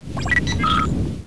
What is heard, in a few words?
WALL OFF